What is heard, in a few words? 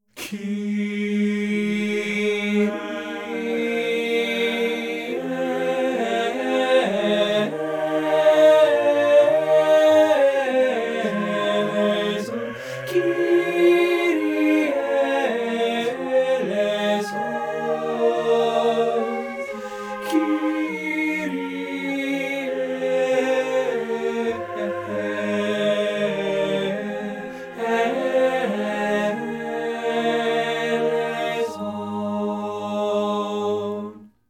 tenore kyryje